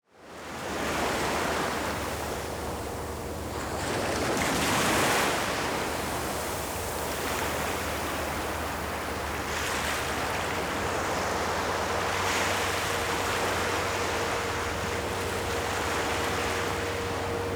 California Beaches, Malibu Underneath Boardwalk, High Tide, 3ft from water